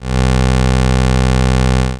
Pulse

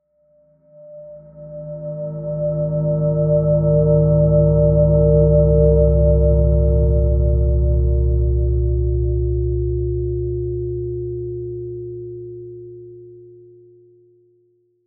Hit, Earth & Steel

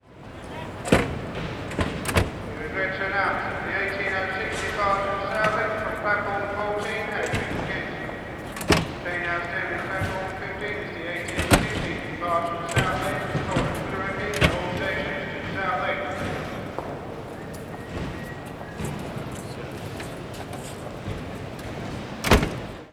Stochastic Train Doors

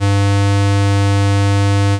Square Wave

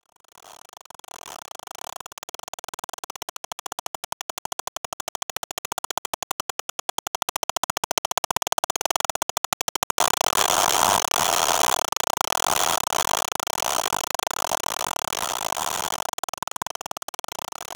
scraper

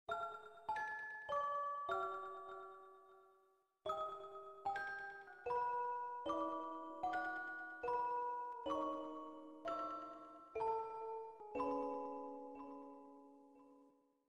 evil Melody

